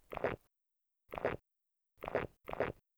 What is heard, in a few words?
swallow; Lewd SFX